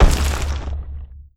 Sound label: Knight Land